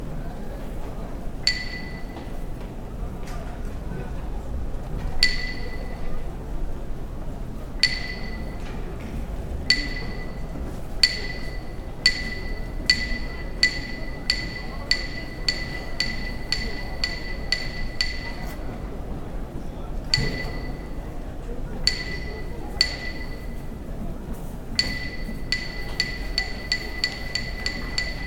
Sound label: before Sumou fighting